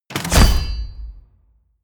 medieval click heavy positive

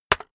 keyboard external enter hit very fast

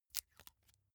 package tape handle short

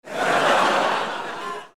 laugh

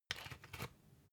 usb port metallic soft in

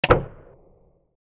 noisy impact

